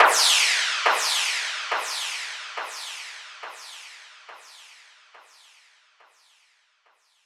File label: Gunshot